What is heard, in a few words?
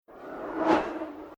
Whoosh Swish Windby Plastic Pipe Horror; Sound Design; Title FX; Whoosh Sound Effects